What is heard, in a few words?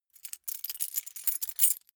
key ring mid size rattle; objekte; schluessel